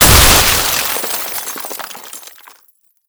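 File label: brute impact heavy, vehicles; Dystopia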